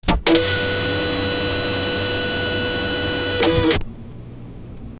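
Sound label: 3d printer completed print; 3d printing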